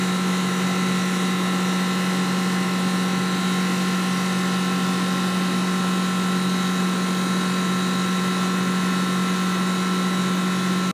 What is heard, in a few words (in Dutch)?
Airco